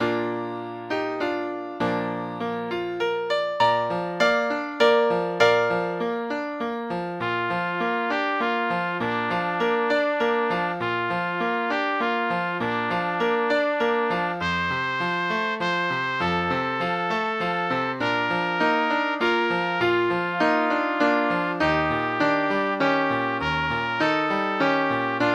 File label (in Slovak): pre trúbku a klavír; Tichá noc